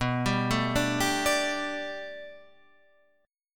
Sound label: D Suspended 4th B, guitar; Dsus/B; arpeggiated; JGuitar